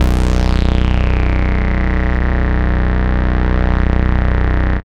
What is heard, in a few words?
FILT BASS; BASS SYNTHES; AKAI; Big Beat Samples & More